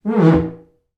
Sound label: household body movement in metal bathtub with squeak